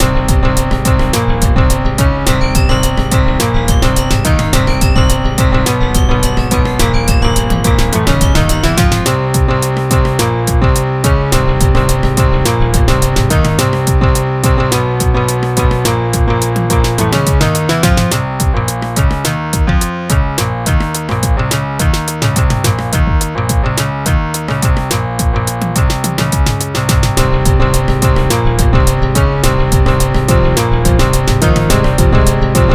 wild battle

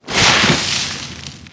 ability subduct up

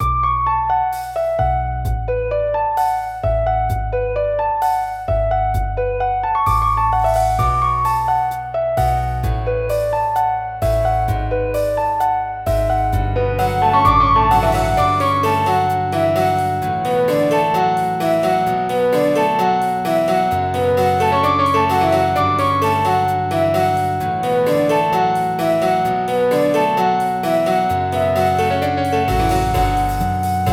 Night Escape, music; Made with sunvox + kiarchive

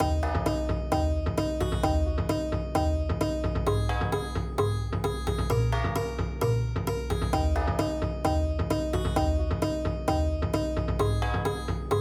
ditty at the camp

